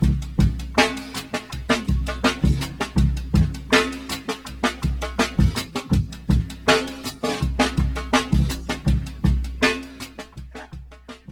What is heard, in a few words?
pattern slow, drums